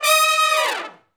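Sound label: Hi Short Falloff (Eb) unison, Horncraft for R&B; Sections; Crimson Blue and Fabulous Horncraft 4 RnB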